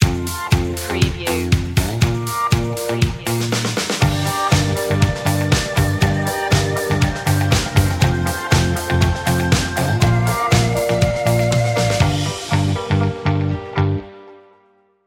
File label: catchy groove